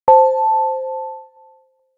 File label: Round, notifications; Realme 8